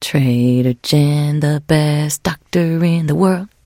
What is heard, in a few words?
trader jen singing, 七日杀; 商人杰恩